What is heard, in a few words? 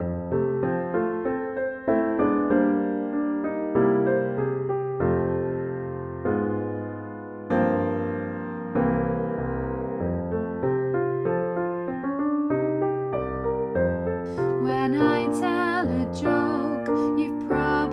alto